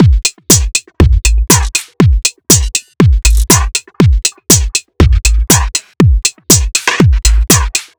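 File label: Techno Beat, Drum Loop; Teckno Rook Beat; Sonic Foundry (Sony Creative Software); The Electronic Music Manuscript Collection; Drum Loop Structures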